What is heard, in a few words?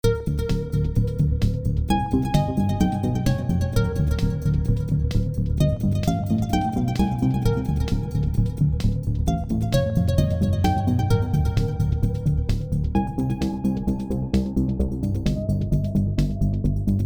hardcore